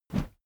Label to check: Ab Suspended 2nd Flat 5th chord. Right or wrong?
Wrong